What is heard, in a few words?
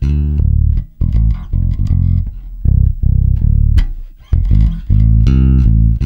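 shuffle D, bass; Spectrasonics; Bass Legends; SHUFFIT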